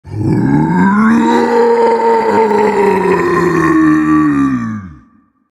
Vocal Colossus Scream; Minecraft Story Mode

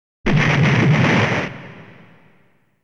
Hero Crash

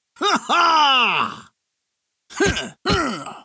Victory; Puzzle Chronicles; PS3; PSP; X360

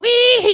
clobber; clobber tote; Tiki Kart 3D

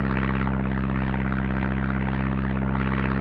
Amb Generic; Dirt 4

